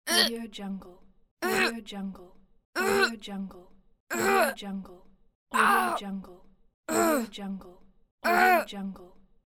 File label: teenage girl impact grunt pack